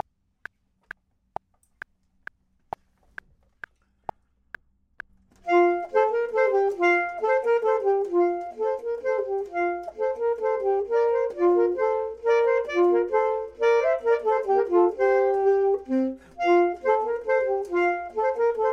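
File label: both, Saxophone and Clarinet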